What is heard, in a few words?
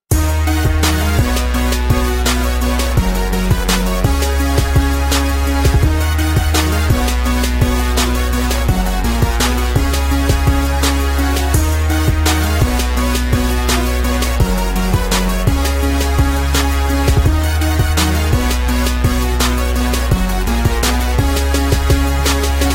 Very Catchy Ringtone